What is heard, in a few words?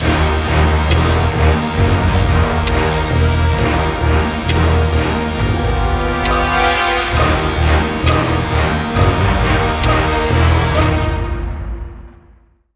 Sting Microgame Timer; percy jackson and the olympians the lightning thief 2010 nds gamerip